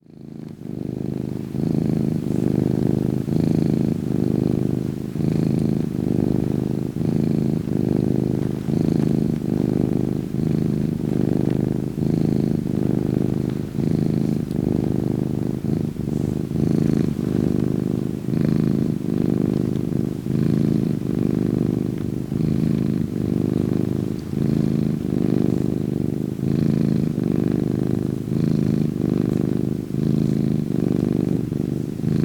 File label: cat sounds; Wilson